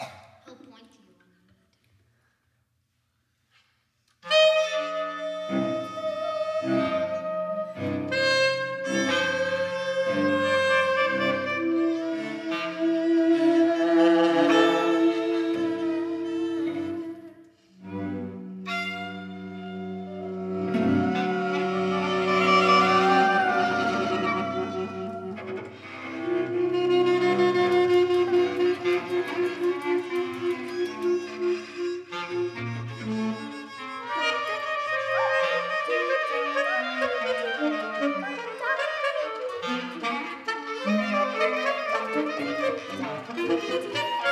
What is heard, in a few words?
Improvisation